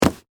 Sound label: Cardboard Box Grab, Foley, Cardboard, Box, Grab; Materials; Blast Wave